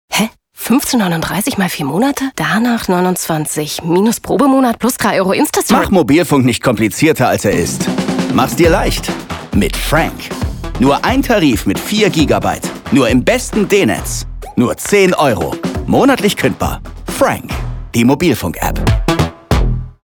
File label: 20s Funk Spot